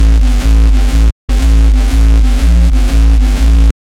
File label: progressive house buzzing; electronic music